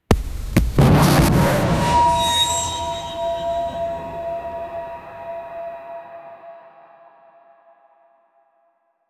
player upgrade blast; vfx

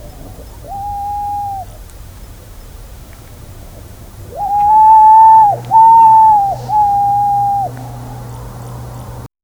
one red civet making soft; nighttime

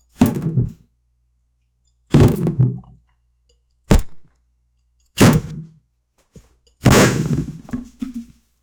a suspenseful hum plays, stomps

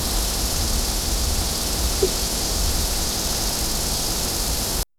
soft forest wind rustling; crickets

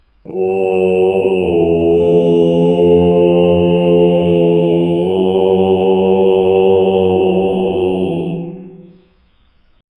sinister monk chanting

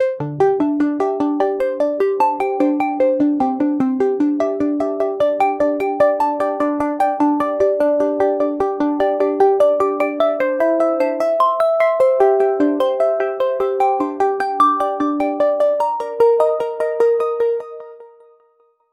light melody with a gradu, commercial

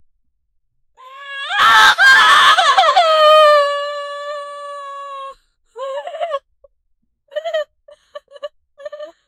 women crying sound

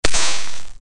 salt gun shot

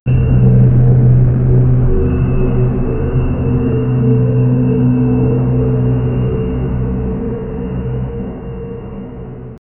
a dark ambient industrial

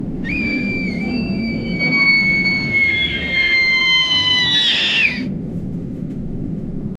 inside a crashing airplan; disaster

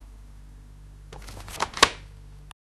turning papper page

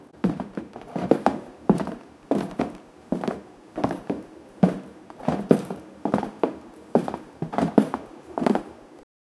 sliding on the rails with, rails

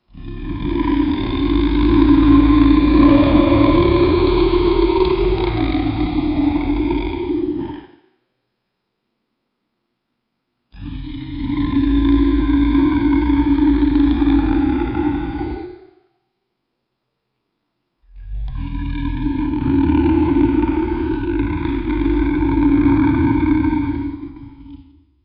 a beastly monster roaring

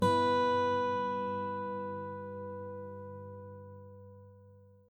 meditation

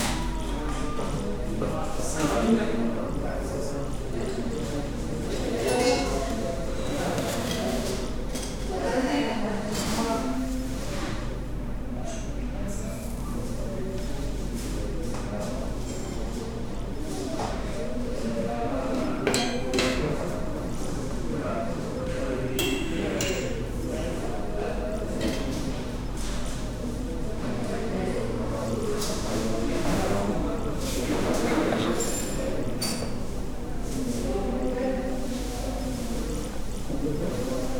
public restroom, interior

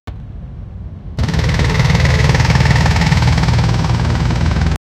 sound of accident with ba, bang

